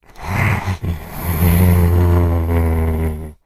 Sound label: idle, zombie; justzombies